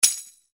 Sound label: super stack stop; Royal Demeanor